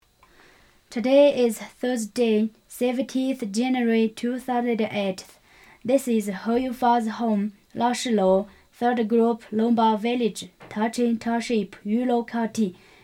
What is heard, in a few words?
Yulong Instrument